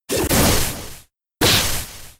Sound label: Sand Attack